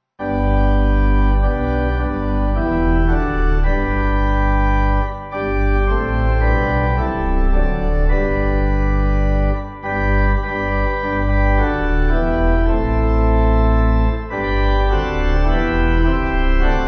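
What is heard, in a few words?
pipe organ hymn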